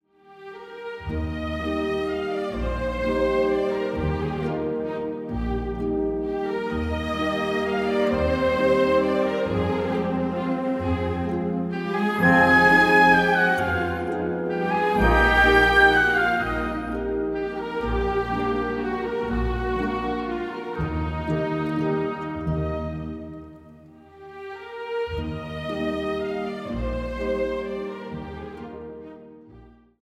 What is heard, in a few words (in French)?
Valse; Coppelia